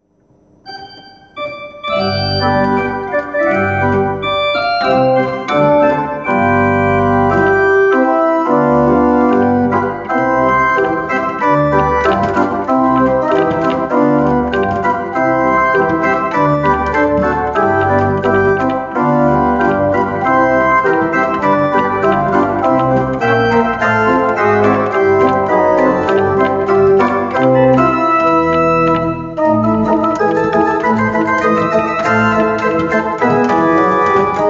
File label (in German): Feierabend-Polka